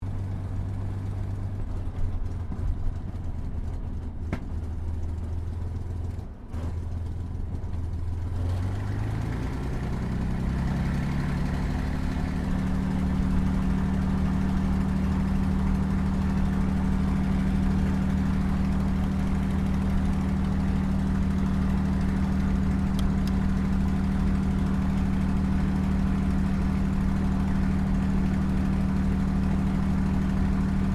AIRCRAFT CAC CA-18 MUSTANG MK23 TAXI SHUTDOWN INTERIOR; CA-18 /P-51 Mustang; Airplanes; Vehicles; Aircraft Collection; Twistedtracks